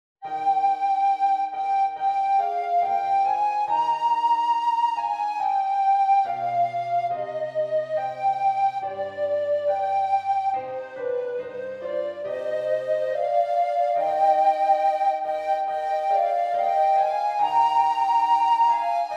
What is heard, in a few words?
Ukrainian National Anthem for Alto Tenor Recorder Piano